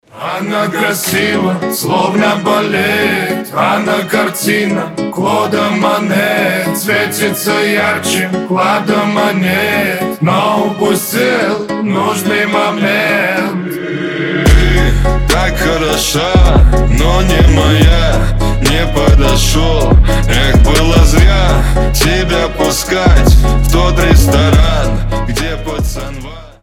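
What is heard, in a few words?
ballet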